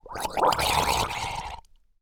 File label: drowned idle; Minecraft